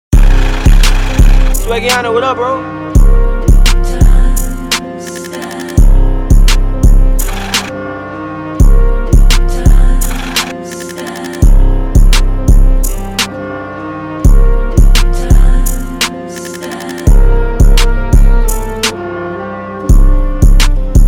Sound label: instrumental